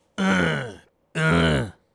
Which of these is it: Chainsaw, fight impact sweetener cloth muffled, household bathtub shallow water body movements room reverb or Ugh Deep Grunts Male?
Ugh Deep Grunts Male